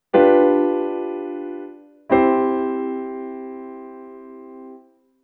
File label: perfect cadence